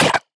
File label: VOX YAH